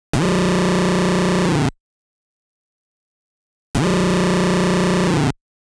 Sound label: Stage WARNING; Darius; Darius 30th Anniversary Box